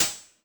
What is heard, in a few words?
Yamaha FS1r Drum Kit 1 Open Hi Hat